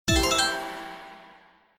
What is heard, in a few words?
Symbol High Win; slots; Wealth Gods2